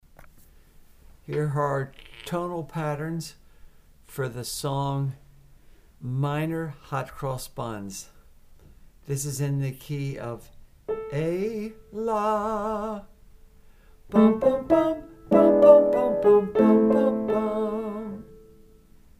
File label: Minor Hot Cross Buns